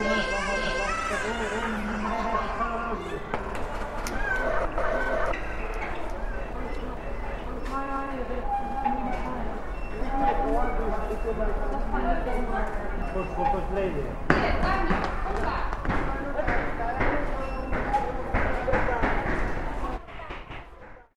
leytonstone underground station concourse remix

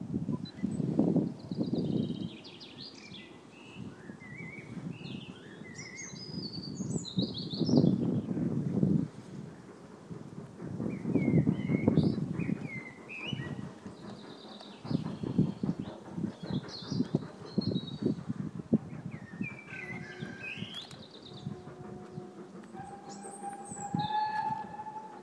sounds of the grave yard in Haworth; bronte country

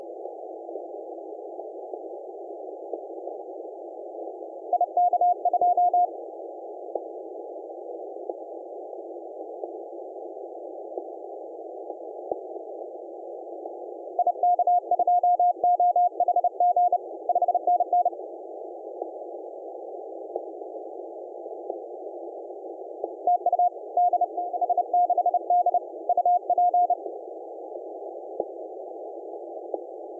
Comoros on 15m cw

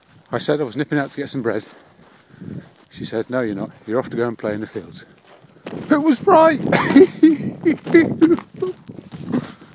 whoohooo

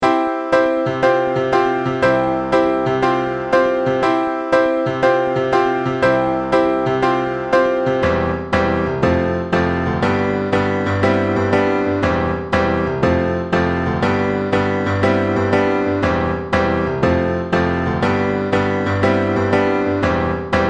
karaoke version

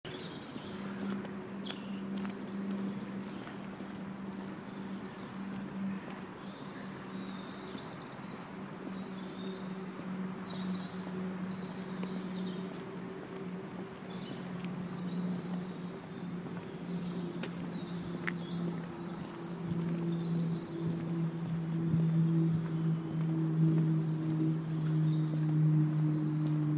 bird song in crystal palace

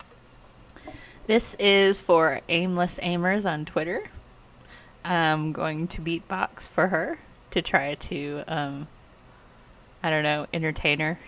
beat box a god awful attempt yet comical

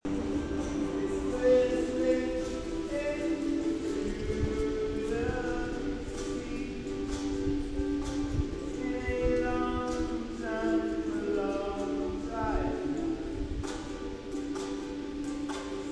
singing

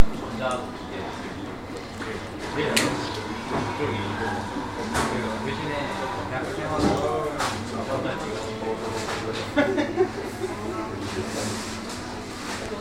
yummy noises; preventive lunch

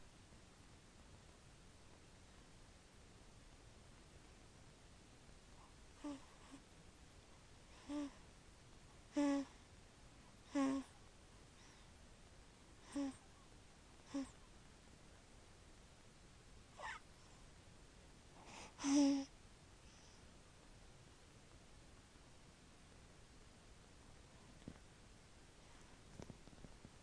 sleeping baby

